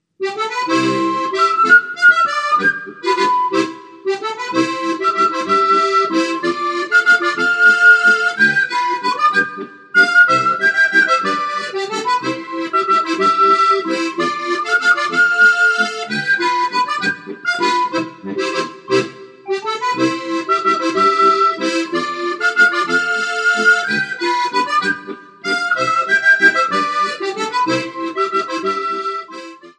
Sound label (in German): Blumenwiesen Landler